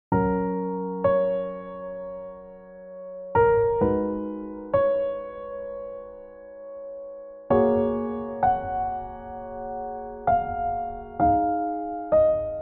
New age; 잔잔

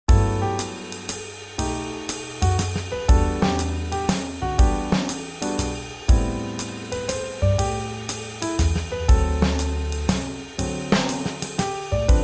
Swing Jazz

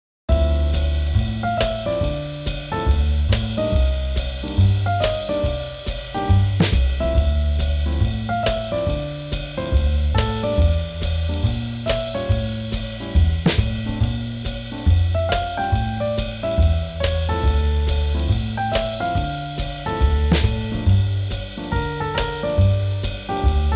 Swing Jazz

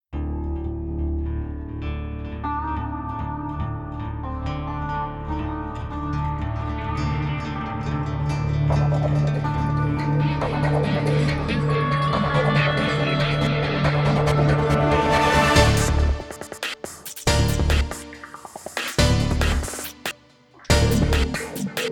Step, Tension Action

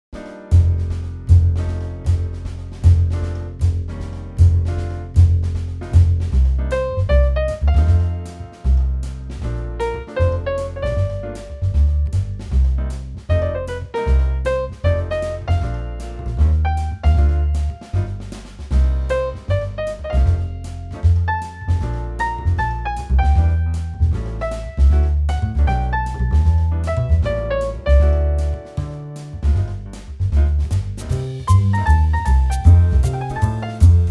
Jazz City; Jazzy

